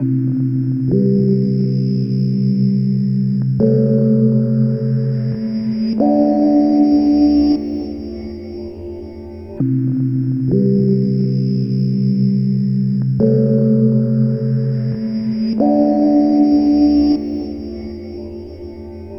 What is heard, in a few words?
Toned Down Melody; Sonic Foundry (Sony Creative Software); Loop Noir